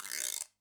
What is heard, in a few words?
GUIRO, Yamaha DD-65